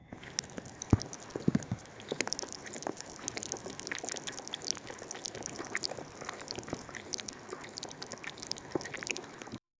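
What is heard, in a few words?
tone for a chocolate commercial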